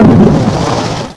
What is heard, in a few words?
LARGE BLW; WAR